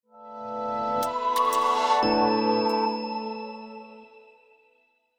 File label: Power On; SFR